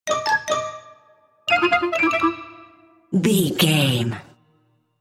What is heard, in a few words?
cartoon